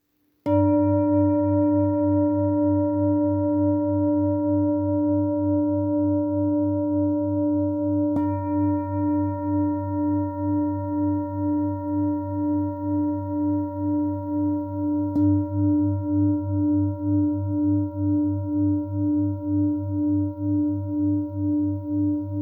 Sol, cuencos tibetanos

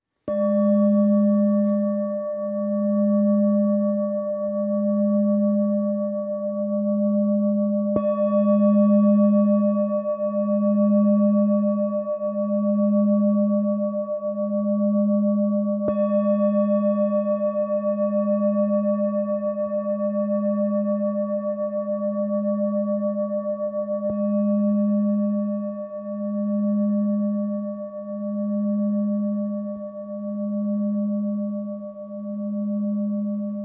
urano, cuencos tibetanos

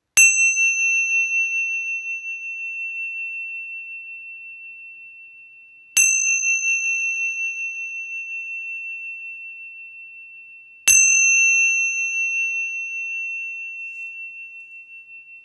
omph 65 fa mi, cuencos tibetanos

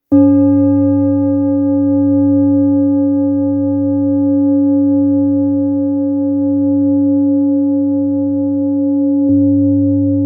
fa sol, cuencos tibetanos

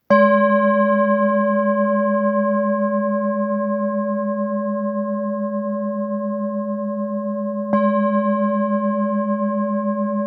Kopre, cuencos tibetanos

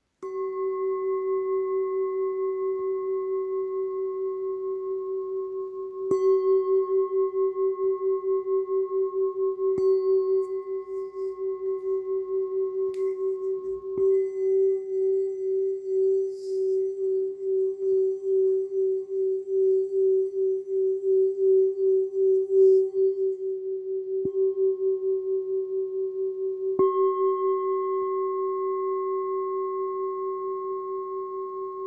Sin pulir fa sol, cuencos tibetanos

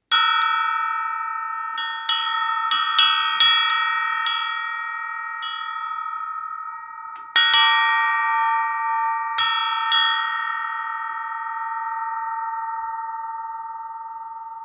Campana de elefante 112

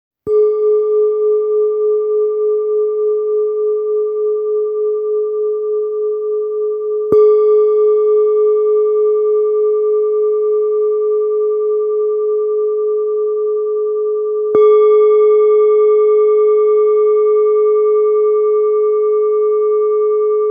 Sol, cuencos tibetanos

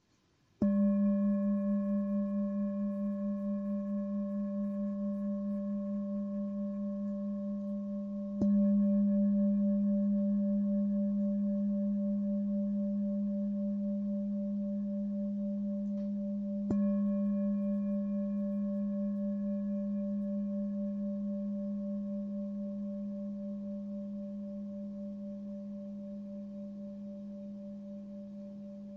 negro 204hz, cuencos tibetanos

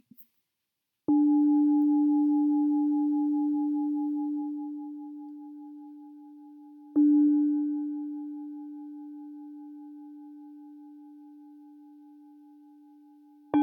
jambati 15cm re, cuencos tibetanos

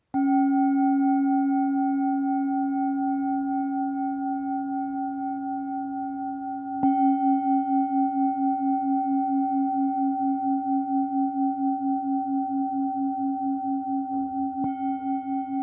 do 272hz 16cm OM, cuencos tibetanos

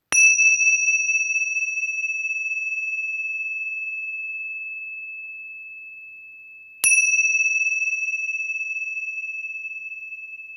Lisos 7.5 mi, cuencos tibetanos

